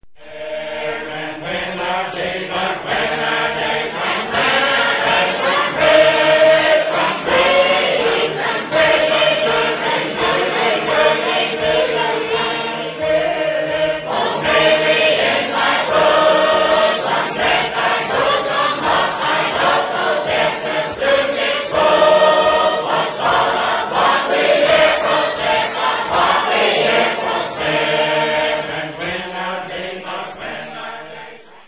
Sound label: harp